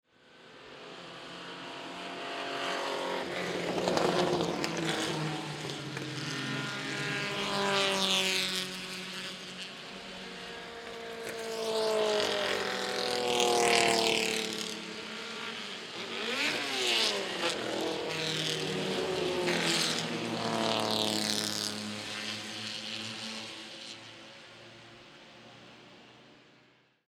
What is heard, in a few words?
Race Car, Many Cars Drive By, 1960S Era Road Racers; Several 1960 Sports Race Cars By , 6 Cylinder; Vehicles